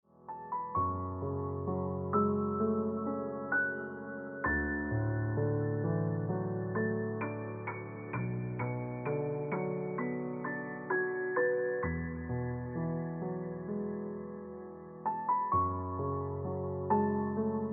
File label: Ringtone Piano Music